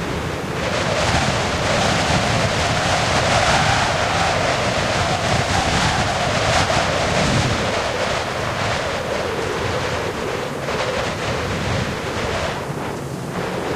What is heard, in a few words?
heavy wind; video games; Left 4 Dead 2; Source Engine; ambient; rainscapes